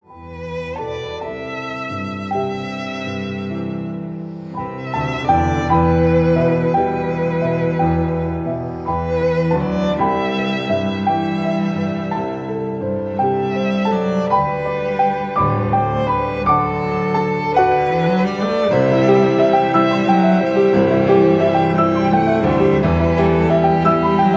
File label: instrumental piano violin cello cover; ringtone